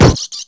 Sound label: fall; Cosmic Rage; ships; Ratika